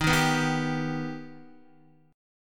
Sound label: Eb Augmented, Guitar; strummed; x,6,3,4,3,3